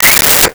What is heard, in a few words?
Walkie Talkie Feedback; Communications